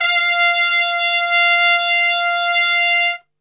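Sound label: F 3 piccolo trumpet, Brass; Best Service; Brass Super Section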